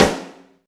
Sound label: Snare, Alesis DM5 Drum Machines